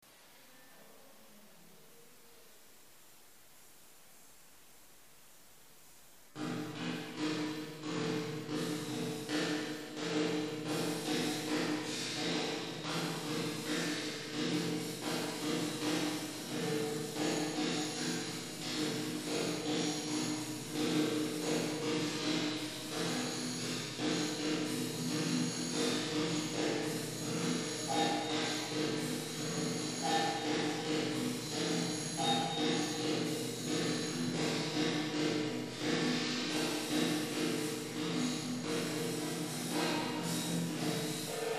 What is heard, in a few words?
African MarketPlace Rhythmus